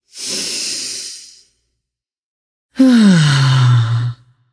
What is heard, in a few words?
nv breath; smite; voicepack; morgan le fay